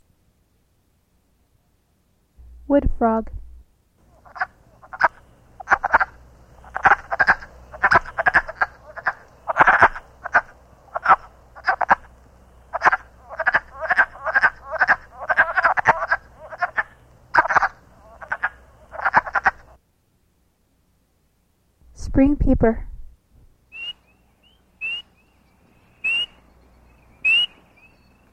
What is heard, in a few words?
frogs